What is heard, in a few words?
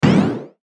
BUTTON ASCEND; Multimedia; Buttons